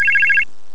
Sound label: telephone bell; Amiga Soundtracker Sample Packs